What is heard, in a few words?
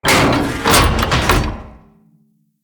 Siege Engine Damage Impact; Weapons; Enemy; Artillery; Ode Siege Engine